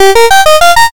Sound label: oneup